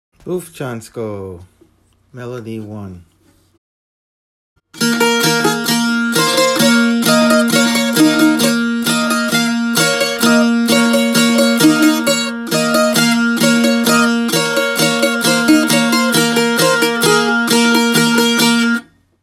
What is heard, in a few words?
tambura; Bufcansko